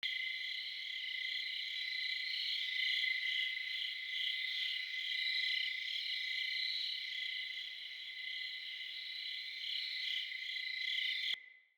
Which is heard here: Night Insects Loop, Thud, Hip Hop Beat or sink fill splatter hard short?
Night Insects Loop